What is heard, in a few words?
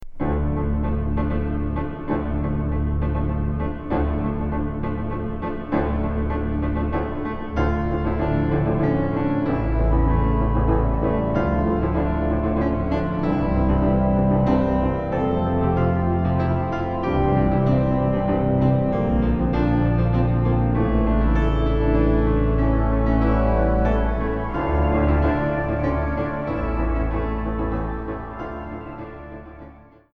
Grazioso